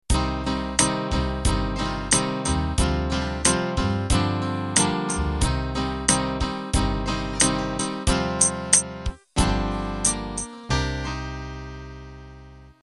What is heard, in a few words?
8Beat slow 70s End